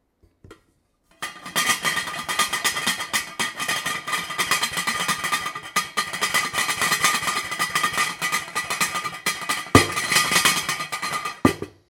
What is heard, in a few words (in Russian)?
kastruli